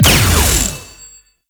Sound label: Proj Hit; Enemies; Abilities; Warframe; Tau; Dax Ninja